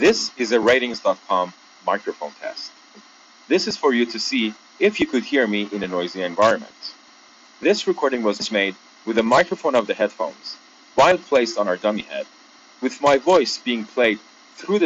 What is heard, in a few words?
noise